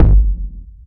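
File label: bass drum, left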